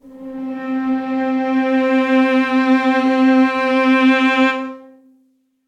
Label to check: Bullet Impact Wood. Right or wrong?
Wrong